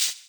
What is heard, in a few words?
Shaker; Equipped Music; Loungin House